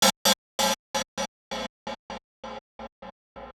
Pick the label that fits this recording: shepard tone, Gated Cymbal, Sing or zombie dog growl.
Gated Cymbal